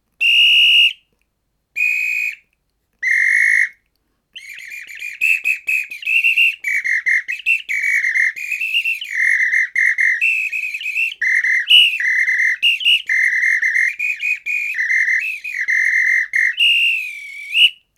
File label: APITO